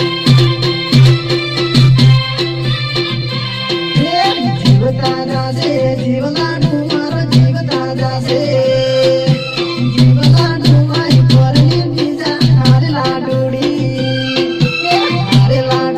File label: gujarati ringtone